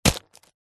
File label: foley tissues small packet facial set down; zapsplat